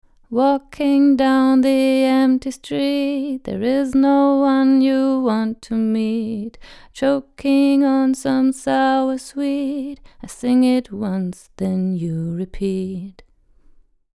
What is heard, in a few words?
Rode NT1 Vocal RME FF800